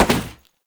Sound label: Step Metal, Foley; Warframe; Oraxia